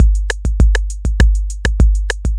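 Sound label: bossa nova, Trommelworkshops; bossa nova 2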